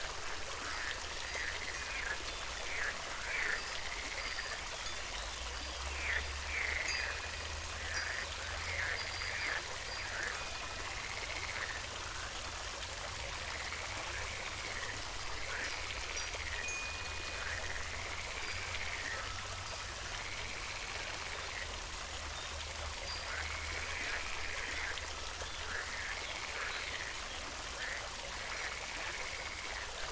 fountain, frogs + wind chimes

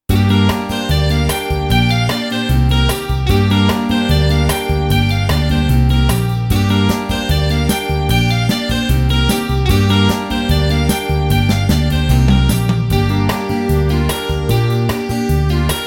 Penta in Gm 150 Fast Country

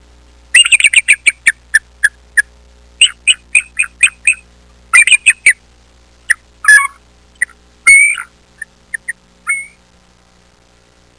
eagle

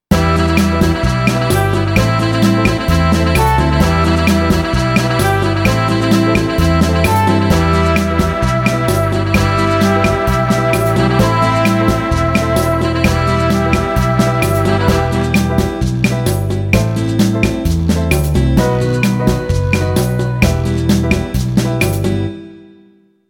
Rock Rhythm in Em Beguine 130

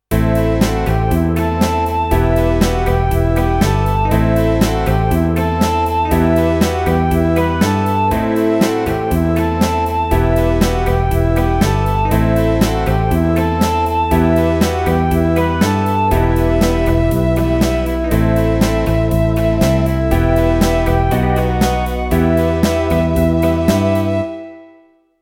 Em Riff hit synth 120

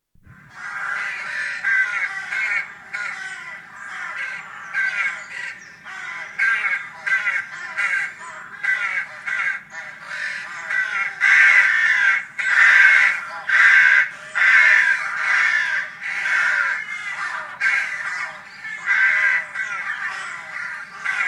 rooks

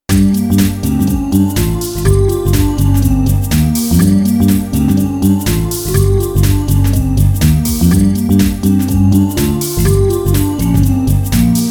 Blues Rock in Gm House 123

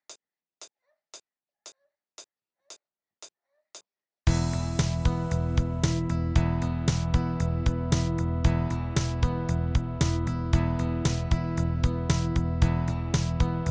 Blues, Rock & Hard Rock, 115; Rh. Part 3

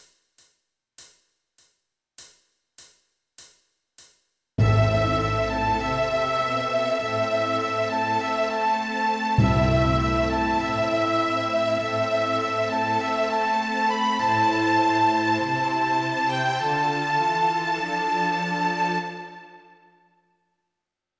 New Melody Small Orchestra, Querflöte

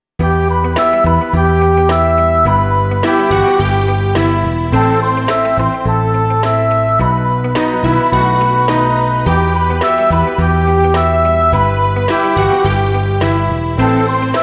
Pop Ballad Classic Theme in Em 106 bpm

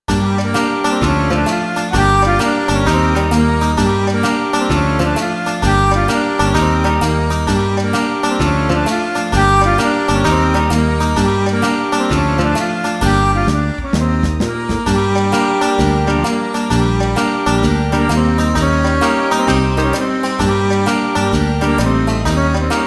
Blues Rock in Gm Country Shuffle 130

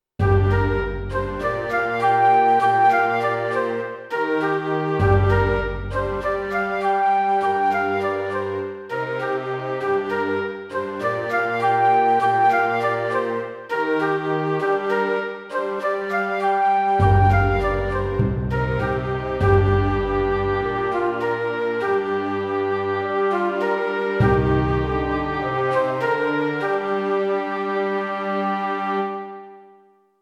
Penta in Gm 100 Symphony Orchestra

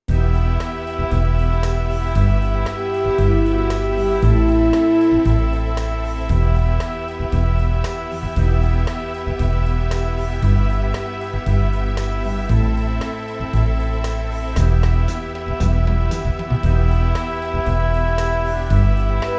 Rock Ballad Strong Strings Oboe, in Em, 116 bpm

